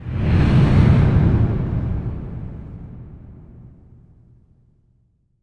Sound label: impact flame, Cinematic Impact